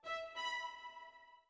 orbit violin; Sky Children of the Light gamerip

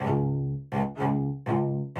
Cello

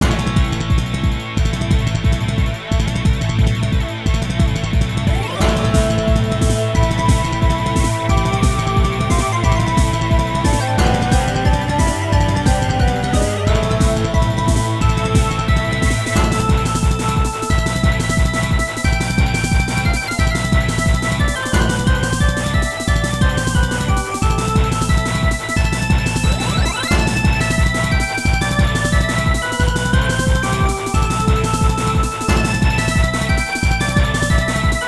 Ending (Bass); Mega Man The Power Battle; CP System II; arcade

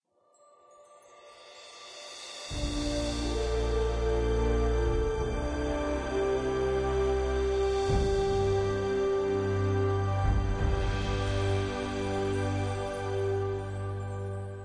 bgm player turn nature; devils demons android game music

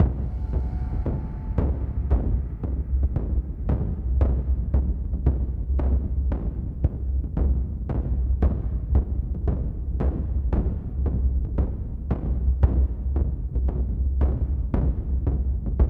Cadence; Wick

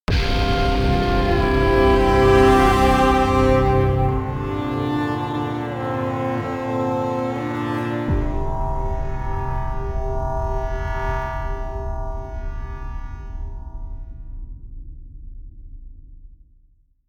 Stinger Blackout Safe Zone; dying light gamerip 2015

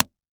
slap face smack

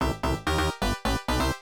Level Up; Jantei Monogatari; Genesis

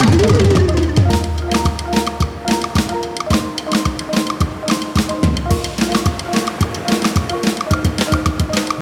octo drum

